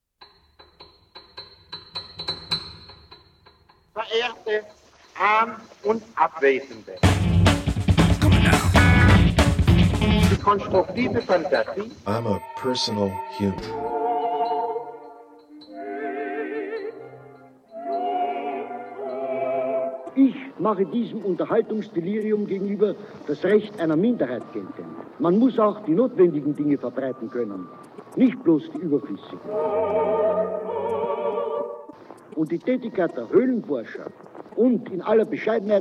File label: Jingl; Sound Rules